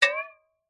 cartoon metal impact wobble

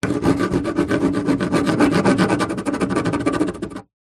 foley wood scratches with metal object; zapsplat

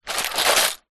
foley shells in plastic container movement; zapsplat

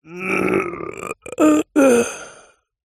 human breath last dying

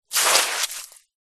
wet sand hand dig in; foley; zapsplat

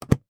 van cab interior light switch mercedes sprinter; transportation; sound effects; sound effects one

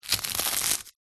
nature ice thin crispy movement crush

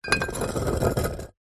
bottles x2 beer full movement scrape on concrete; foley; zapsplat

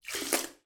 human spit bath water from mouth

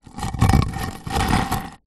brick small move scrape on dirty concrete

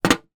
industrial drill bit down on metal tool box lid; zapsplat

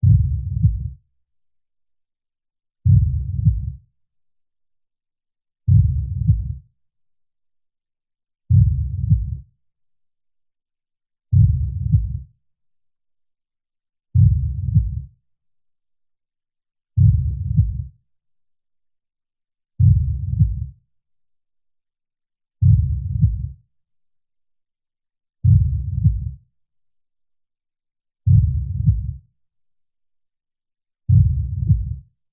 Hrtbt Dp Slw

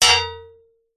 sword strike metal could be shield; zapsplat

